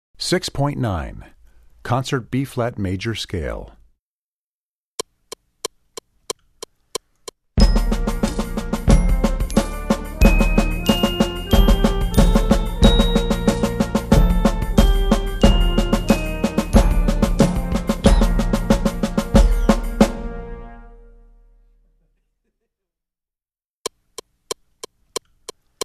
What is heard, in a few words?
Concert Bb Major Scale; Percussion Book 1